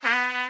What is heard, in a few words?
Trumpet Failstate; Instruments